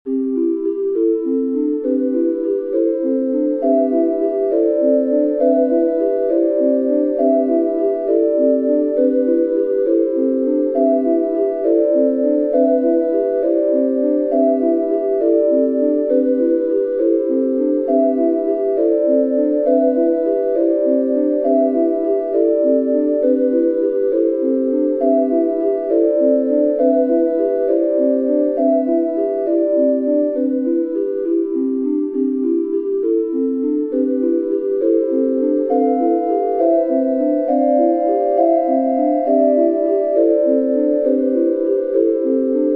calming ocean

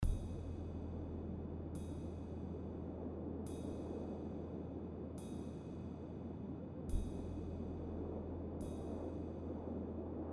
tension loop

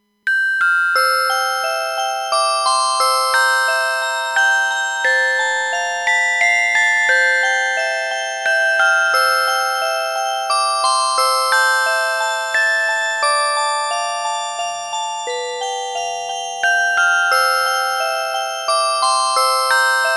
music box

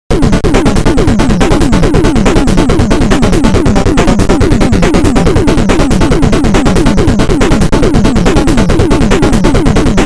boss beat

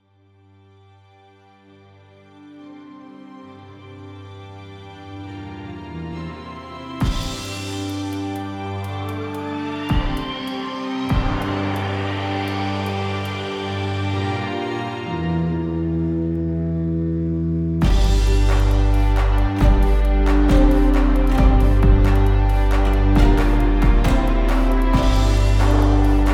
dubstep thing

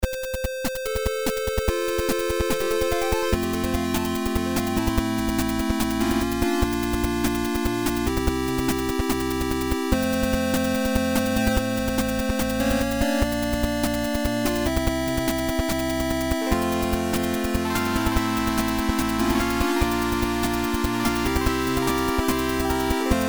8 bit mix

